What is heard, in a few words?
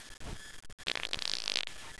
fart